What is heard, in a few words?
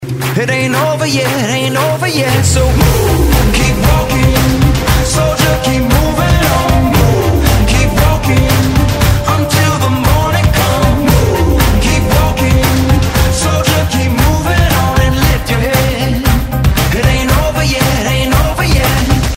Move medium dance